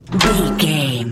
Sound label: metal door hatch open squeak